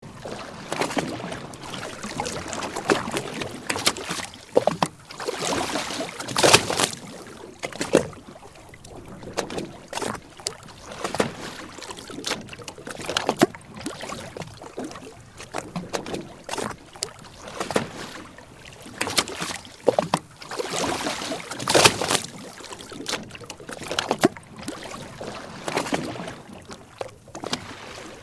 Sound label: Boat ambience fishing small waves loopable